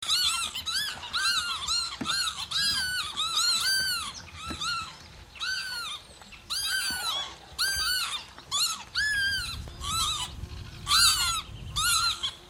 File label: Northern Lapwing Vanellus vanellus